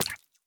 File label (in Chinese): 蝌蚪死亡声